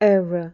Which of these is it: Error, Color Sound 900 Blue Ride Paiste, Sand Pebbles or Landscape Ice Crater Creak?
Error